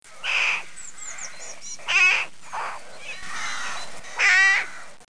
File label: birds; Mr. Wonder's Greatest Toyshop on Earth